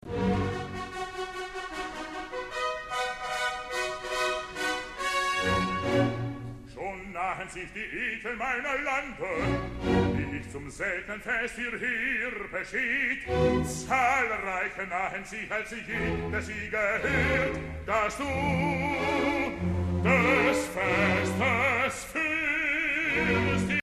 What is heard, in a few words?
trumpets; Wagner; Tannhuser Act 2; Entry Of The Guests; Sawallisch